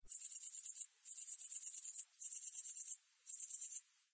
crickets, ambience

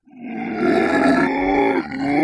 zombie voice idle

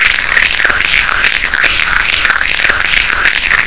spider latch; hatchet

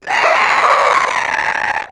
mutant runner die

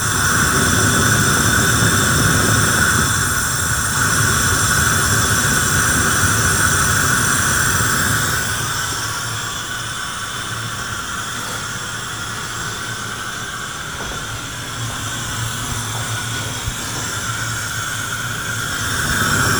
leaking gas; barbarossa

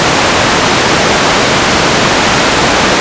waterfall

